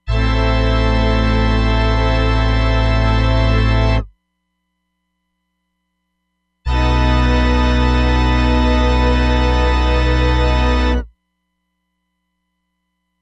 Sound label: Cathedral, Roland DEP-5; Factory Patches